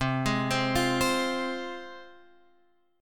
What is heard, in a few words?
C Suspended 4th Sharp 5th C, Guitar Open F; Csus4#5 arpeggiated; x,7,8,7,8,7